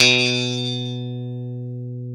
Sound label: funk B1, strat; InVision Interactive; Lightware VOL-1; Instruments & Percussions